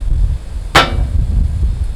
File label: Thunk guardrail; fieldrecordings